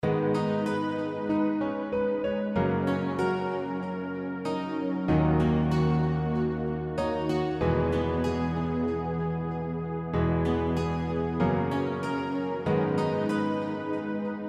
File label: Urban Reggaeton